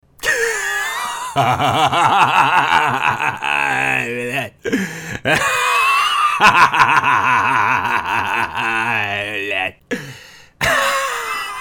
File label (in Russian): SMEH; LEADERTV